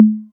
808 Conga Lo, Novation Drumstation; Novation DrumstationV1

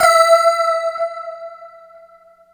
Octabell in E, Keyboards

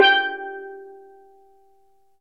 steel drum

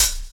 Hhat, Hihat; Equipped Music; Loungin House

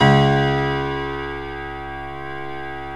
GRAND PIANO; OMI - Universe of Sounds

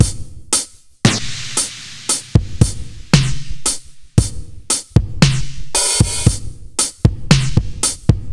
Beat Shady; Zero-G; Future Wave

